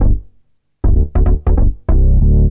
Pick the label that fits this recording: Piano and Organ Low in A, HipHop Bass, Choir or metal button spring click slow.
HipHop Bass